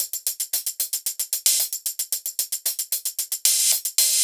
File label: hihat drum loop disco 113